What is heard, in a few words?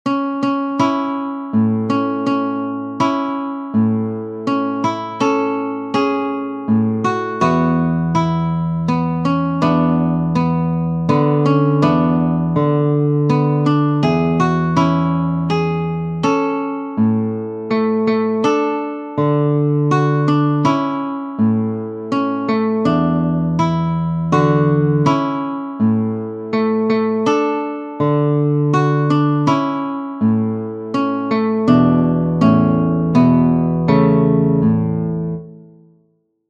lullaby wiegenlied berceuse; brahms johannes